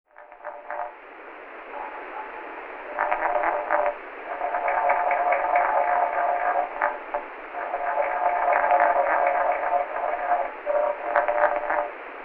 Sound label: Discoglossus Pictus Scovazzi, amphibia